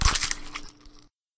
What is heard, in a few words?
egg; hedgewars